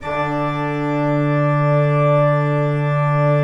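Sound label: ORG D5, KEYS; Synclavier Sampler Library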